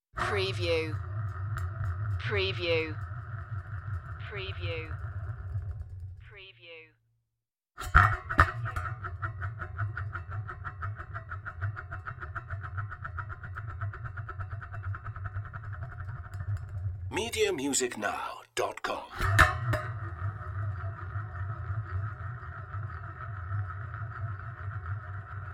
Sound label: sheet metal lid drops turning